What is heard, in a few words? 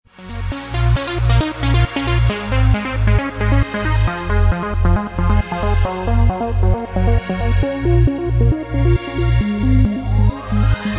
Bassline